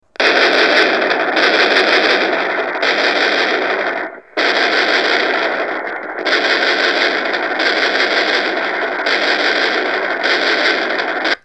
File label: machine gun